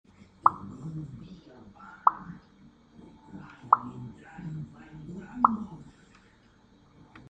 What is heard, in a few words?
pop sound ringtone